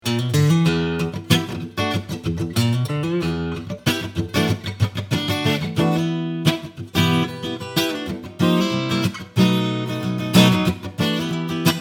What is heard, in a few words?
Takamine GD93 Strumming High